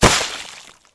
head death

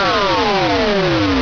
tone die

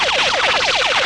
candy attack, weapons

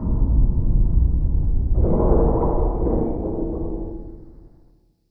freight fall distant

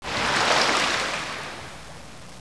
water exit

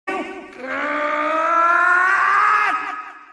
draw crowbar; donny

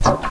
ammo gas

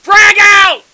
throw; Opposing Forces; FSoldier

